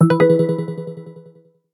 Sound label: Swift, notifications